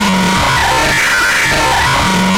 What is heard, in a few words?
jumpscare sound; night shift at pizzeria